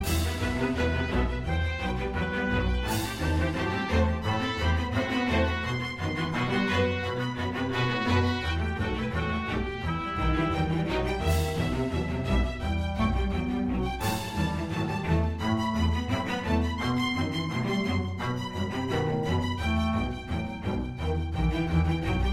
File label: Danger 172bpm 4 4 time; dont starve